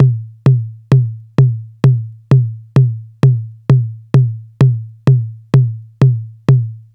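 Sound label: lo tom, saturated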